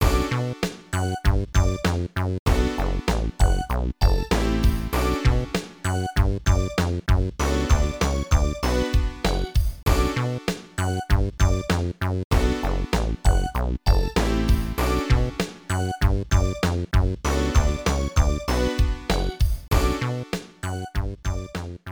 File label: Ending; gunbird 2 arcade 1998